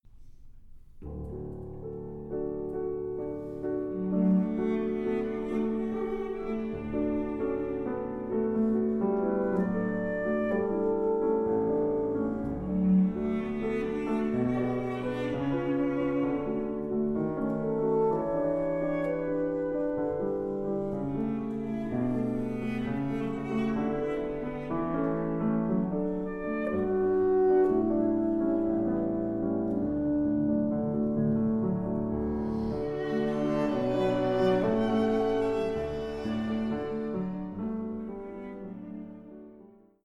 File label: Divertissement TIMPANI